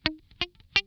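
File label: damped SCALE B4, Funk Guitar; 90 s Sample CDs; Zero G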